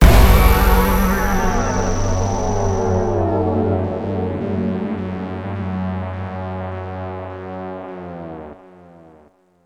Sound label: Ending Sting